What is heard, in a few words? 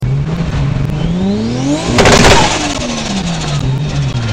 Backfire